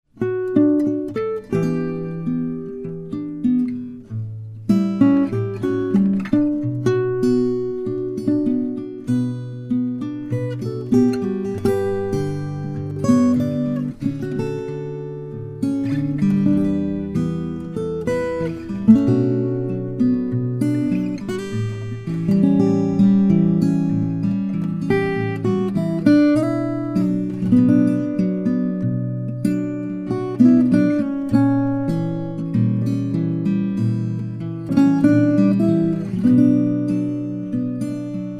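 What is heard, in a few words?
Samba, banjo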